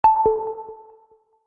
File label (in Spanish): peak notificacion oppo